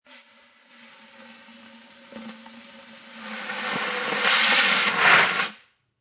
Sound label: Powder fire extinguisher, long shot beginning slowly, with the valve; Powder fire extinguisher, long shot beginning slowly, with the valve slightly pressed, towards the end being fully pressed; Spraying